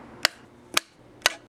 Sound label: off switch